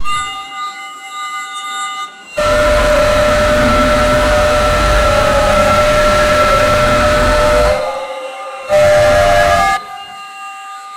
an emergency alert in a, bunker

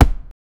weak kick on heavy bag, boxing

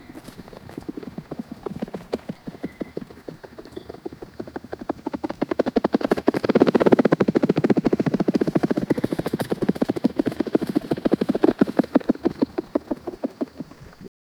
many different animals; field

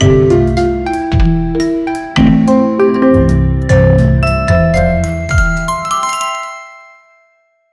short music jingle before

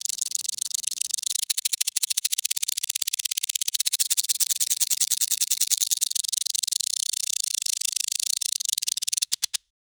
extended riffle shuffle, card game

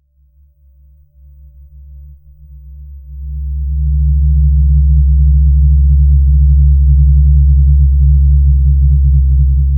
soft rising hum focus sou; meditation

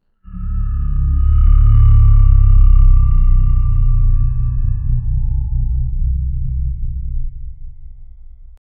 low eerie spirit whispers

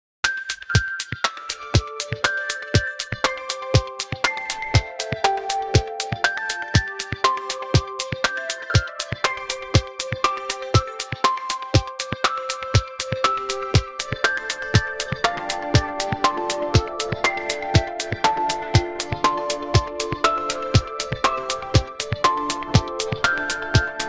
synthwave kids pop with w

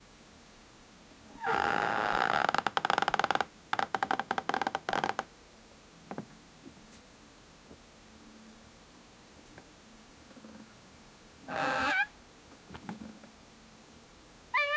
clapping while bed squeak, bedroom

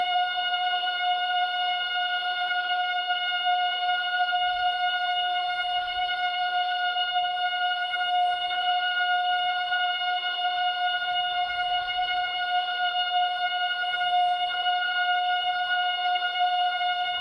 prison

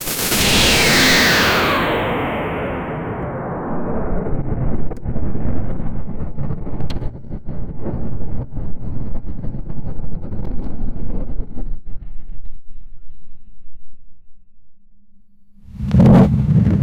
the sound of a meteorite; apocalyptic

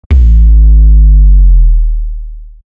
hit bass effect

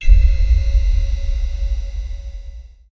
divine lightning impact w

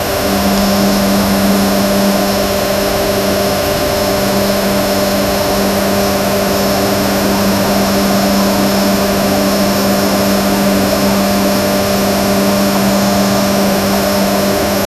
factory facility producin

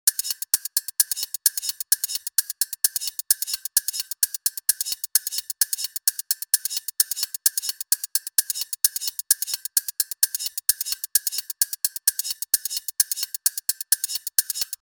closed hi hats hit in ete; cosmic

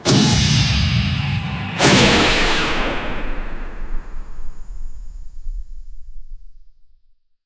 charging turbine with; cannon shot

